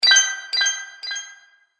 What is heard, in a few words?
Magic Purchase Delay Ding; Cartoon Sound Design Sound Effects